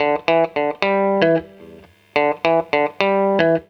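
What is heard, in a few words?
guitar, Roots Funk; USB Soundscan vol.41; AKAI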